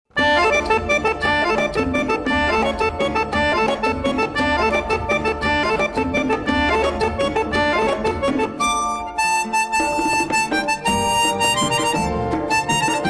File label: Instrumental Music